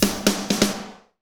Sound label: Medium Tekno, Drumscapes Roland